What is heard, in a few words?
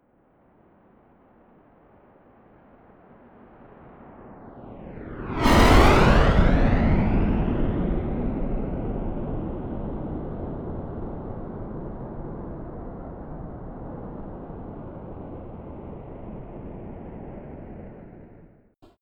military jet rafale flyby fast close